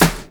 SD, Dance Mega Drums; Best Service; SD-HIP

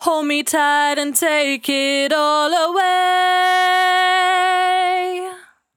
68bpm D, Velvet Vocal; Zero-G

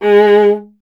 viola, solo instruments; Miroslav Vitous; Solo Instruments VOL-1

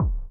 kick, Millenium MPS-400

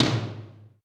Roland U220 Dry Set

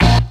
tuners

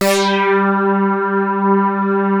Prophet 5 G4, Synthesizer; Hollywood Edge - Giorgio Moroder Rare Synthesizer Collection

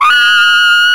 SAX Tenor GROW; Roland LCDP07 Super Sax

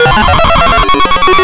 LFO seq; Big Beat Samples & More; AKAI; ESO SEQS KIT

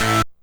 Synth Hit A

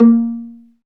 STR VLA PI, Chamber Pizz; Roland L-CD702

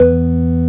ORG E, M1 ORGAN; Best Service ProSamples vol.10; House; AKAI

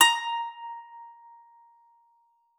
PSAN A#5, PSALTER; Xsample VOL-8

